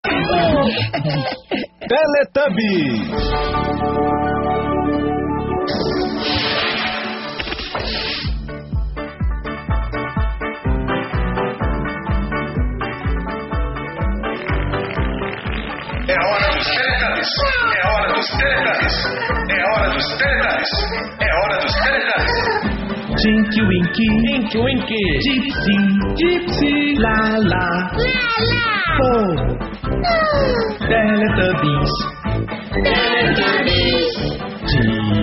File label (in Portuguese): abertura dublado; teletubbies